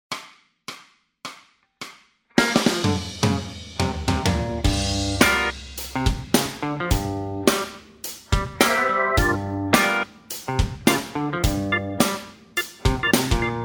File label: bass blues funk, backing tracks